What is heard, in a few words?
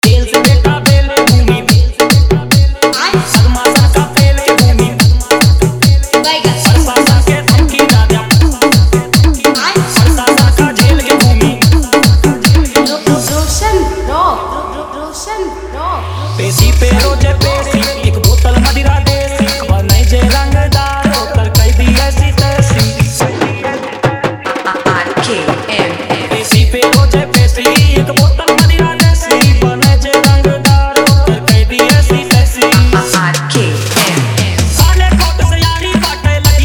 Hit Bhojpuri Mix Full Vibration; Dj Roshan Rock